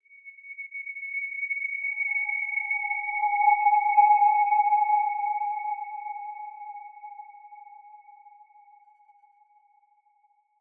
Horror Musical Science Fiction Swell Haunting Eerie; Horror Musical Science Fiction Swell Haunting Eerie High; Scary Soundscapes; Blastwave